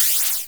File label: cymbell